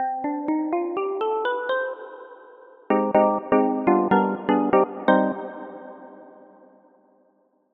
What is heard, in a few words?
Preset Sample Electric Piano